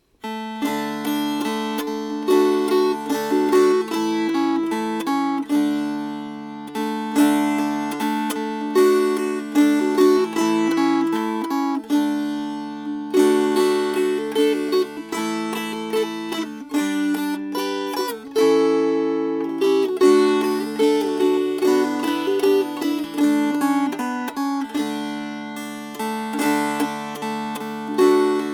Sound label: Strummed; It Was A Saturday Evening